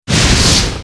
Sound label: hellfire effect